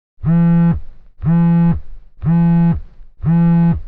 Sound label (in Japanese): 携帯バイブレーション; 効果音、ジングル